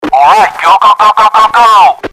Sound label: start; Commentary; English-USA; AOS RF1 Kart Demo